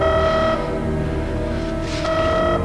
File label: red alert